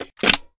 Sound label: pi reload empty magin; gmod